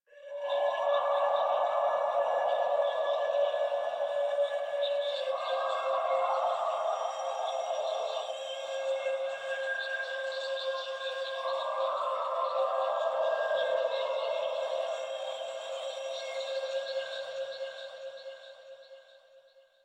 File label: Choir; Warframe; DE; Sentients